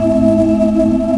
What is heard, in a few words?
drone loop, ships engine; Quantum Redshift; XBOX